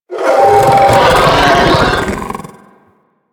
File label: Sentient Vocal Attack, Enemies; Warframe; DE; Sentients; Ortholyst